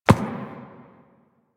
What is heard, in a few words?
Ram Step Walk Dirt; Animals; DE; Warframe; Quests; Duviri